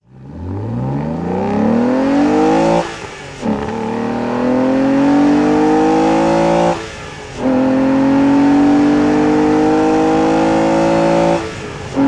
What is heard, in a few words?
Aston Martin DB9